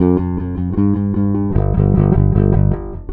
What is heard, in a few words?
bass, Loops, Phonky